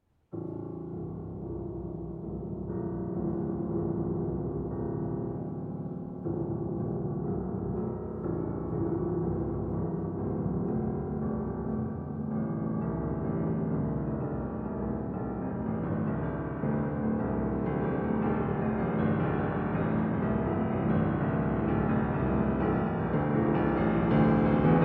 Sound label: Suite For Violin & Piano V. Quest